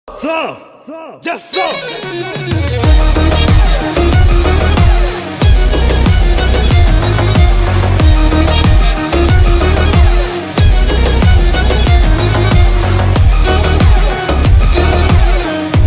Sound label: ringtone bgm ringtone; leo